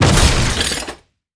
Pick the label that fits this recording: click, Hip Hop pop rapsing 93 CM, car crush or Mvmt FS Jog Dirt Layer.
car crush